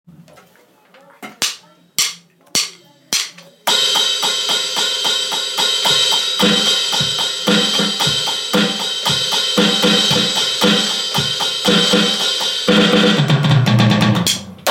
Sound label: drum composition